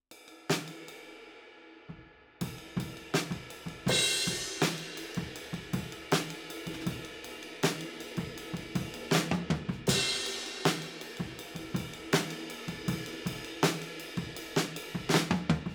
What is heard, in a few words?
Overheads, 160 BPM